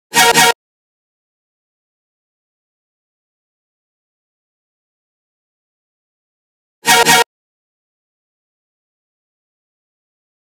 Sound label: 143 BPM D# Min Color Bass Hit; Melody Loops; Loop Stems; Cymatics; Hyperbass Pt 2